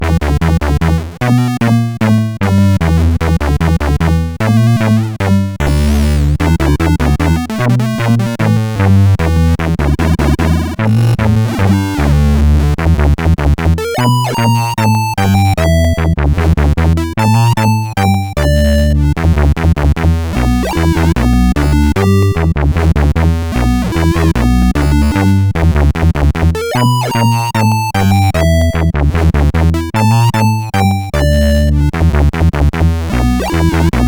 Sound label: Blues, CSG8580R5; Spike Super Blues Experience; hvsc